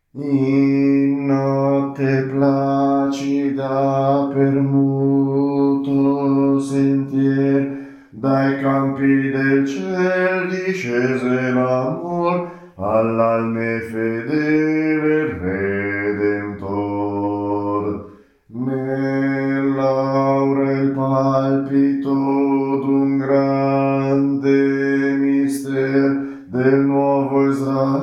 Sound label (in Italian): bassi; In notte placida